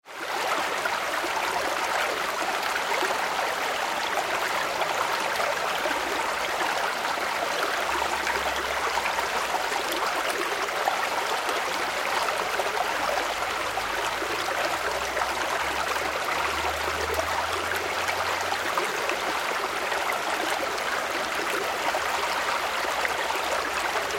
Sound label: Stream Wide and Fast; Stream Wide and Fast 3; Rivers; Nature